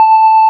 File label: Kill; Projectile Toolkit; Blobcreate; BlueWater